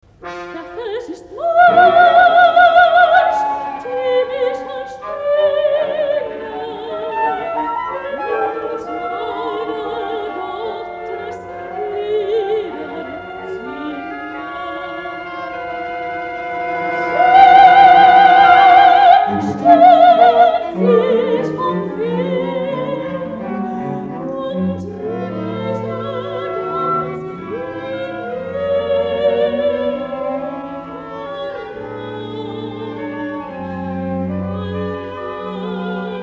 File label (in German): Balladen